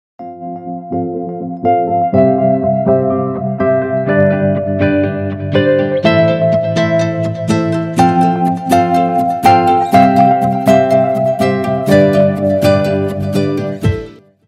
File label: Sweet Sound